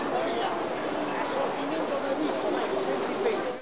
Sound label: house slum; Caesar3